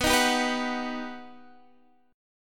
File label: G Augmented Major 7th